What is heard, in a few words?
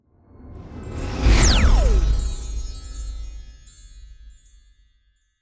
magic warp personal jump sparkle